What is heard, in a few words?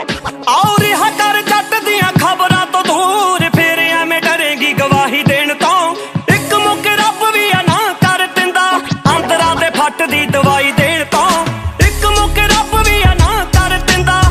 punjabi ringtone